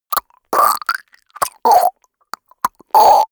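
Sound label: gag, body sounds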